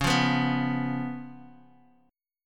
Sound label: C sharp 7th C sharp, Guitar DADGAD